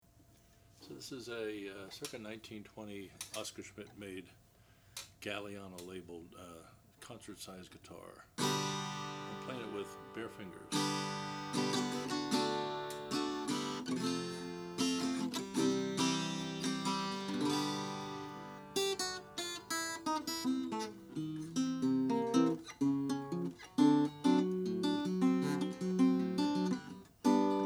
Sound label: 1920 oscar schmidt galiano natural